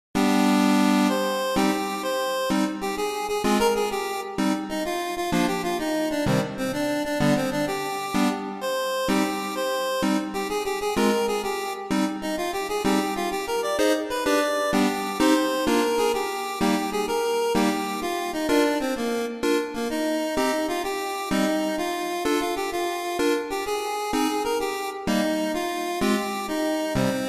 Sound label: 8bit